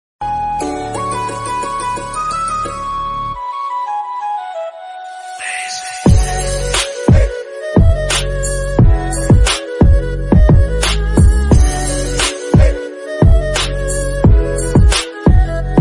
Instrumental Song

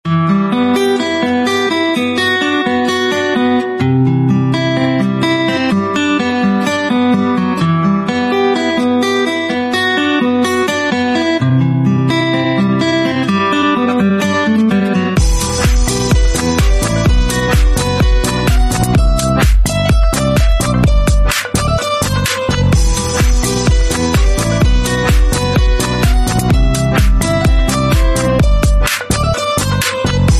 Music Ringtone

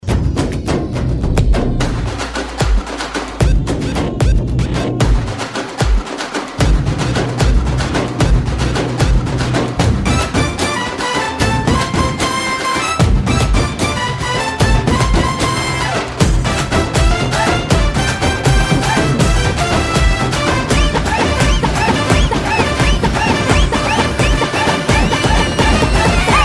Kuthu Music Ringtone; Mersal; Arasan End

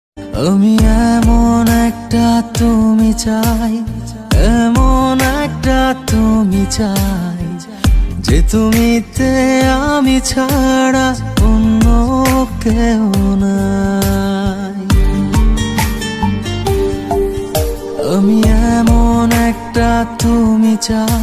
Bengali Song Ringtone

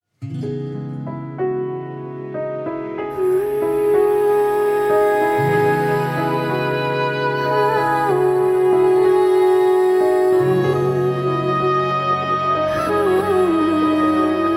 Music Ringtone; Rustom

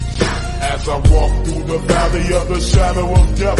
Notification

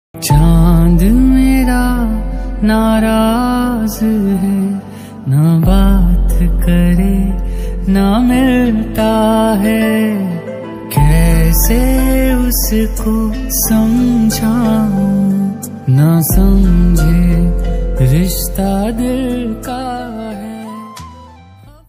Hindi Song Ringtone

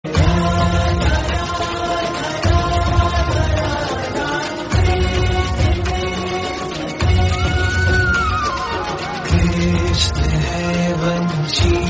Bhakti Ringtone

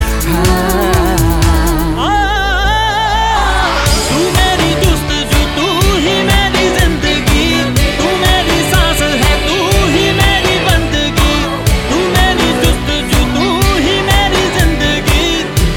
Ringtone for Samsung Galaxy S7 Edge; ringtones

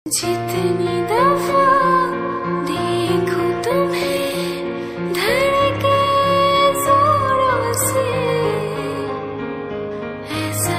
Female Version Hindi Ringtone; ringtones

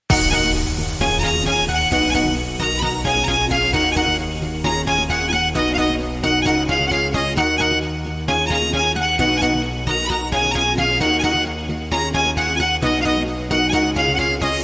Music Ringtone

